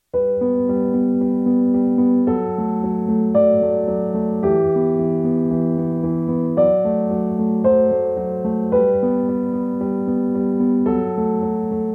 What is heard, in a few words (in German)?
Kaminfeuer mit Pedal